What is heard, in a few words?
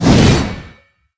swing metal